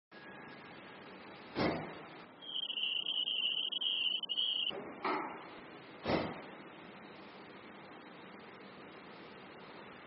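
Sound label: Ambience Carpark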